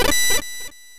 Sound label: Warp Jump; puchicon; dsi ds